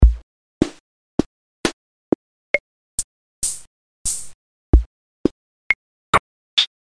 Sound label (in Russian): sintezator effekt